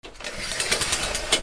BATHROOM

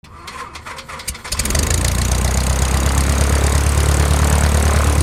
flight

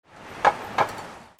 BANGS

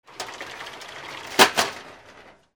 INDUSTRY

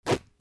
RIPPING

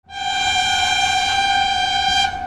TRAINS

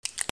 WEAPONS

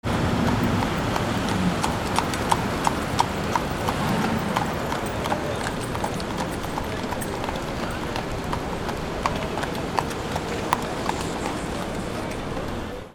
AMBIENCE

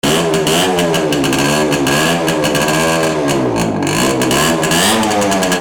VEHICLES

